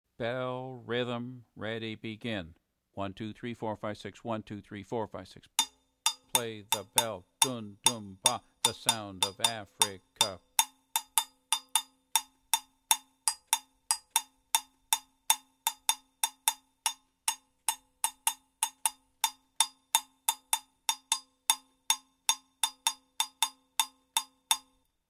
Doundoumba bell

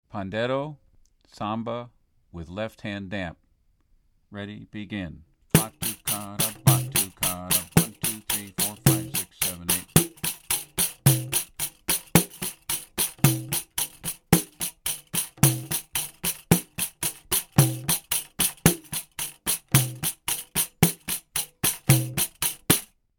Samba With left hand damp

